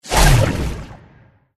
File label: attack water